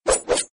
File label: slash; Power Rangers vs Teenage Mutant Ninja Turtles - Ultimate Hero Clash 2